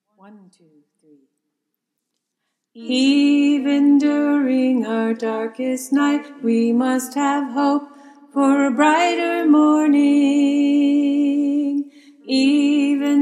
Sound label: Alto, choir; freedom choir